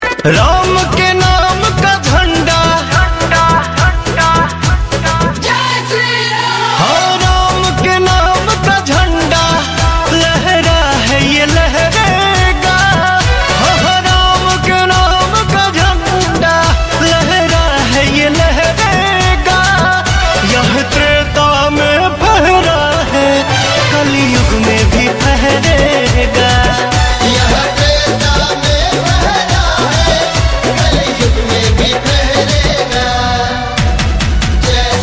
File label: Ram Ji ka bhajan, song